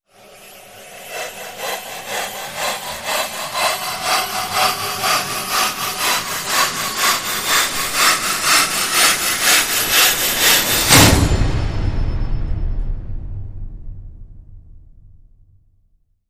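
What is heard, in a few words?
Rise Rhythmic Boomerang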